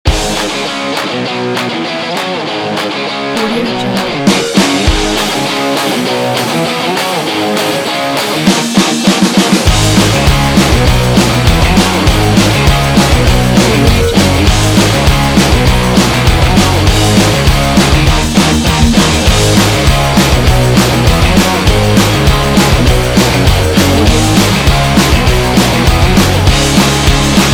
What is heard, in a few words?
punk rock